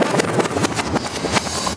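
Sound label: scratch, record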